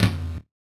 mod tom, Mod Drums; Synths and FX; neuro; Sinistarr

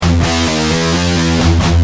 G Heavy, Guitar; Industrial

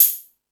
Hip Hop, Drum Machines E-MU Pro-Cussion

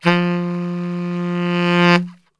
F 1 SAX, Brass Super Section; Best Service

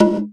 Pop Wood Drum; Digital Sound Factory Xtreme Lead

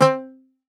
Greek Bazouki, Plucked Strings

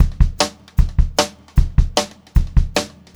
Brushed Drum Loops; USB Soundscan vol.39; AKAI

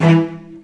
E2 AT SHT R, VCS ARCO, String Collection; East West; The Ultimate String Collection

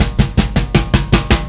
Happy Pub Beat Fill B; Sonic Foundry (Sony Creative Software); Indie Rock Anthems; Session 05 - Happy Pub

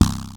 Snare Drum, Cwejman S1 MK2

